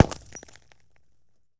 Bullet Into Windshield; Sony Pictures Sound Effects Series; Ricochets - Hits - Whiz Bys

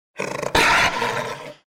Jaguar; Age of Empires III; cinematics

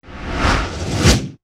Reverse Wind; Title Sequence Effects; Sony Pictures Sound Effects Series

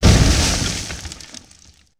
Explosion Medium Dirt Clusters; Sony Pictures Sound Effects Series

